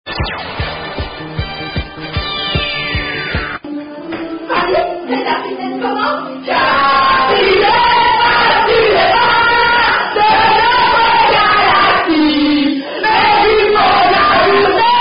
Belguim Sung Song Are You A Cardinal Drinking Game; Caaapitaine Paf